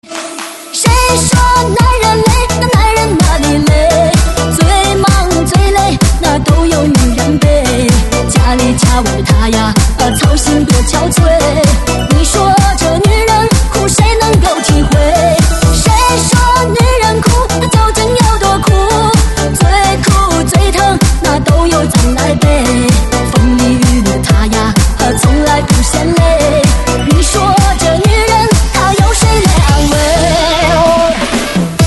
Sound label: DJ